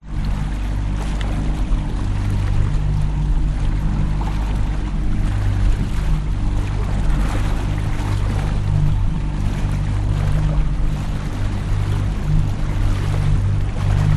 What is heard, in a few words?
transport boat drive sea slight choppy slowly outboard motor 16 ft fiberglass